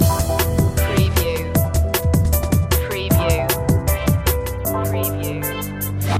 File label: car advert music loop; Soundtrack / Action / Chase